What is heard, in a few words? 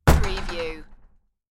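Break Down Door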